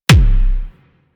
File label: Weapon Punch Rifle; Warframe; Weapons; Rifles